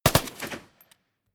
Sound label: rifle ak-47 exterior fire double; comedy-cartoon; Pistols and guns; pistolen-gewehre